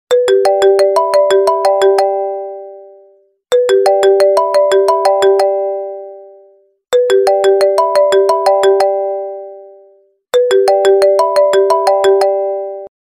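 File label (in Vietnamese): Nhạc chuông iPhone mặc định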